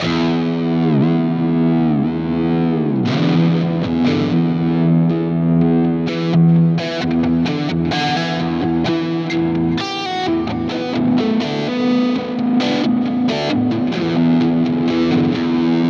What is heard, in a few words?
Blackstar St. James Ambient Crunch